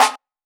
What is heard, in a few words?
Break Snare, Drumset; Roland SH-32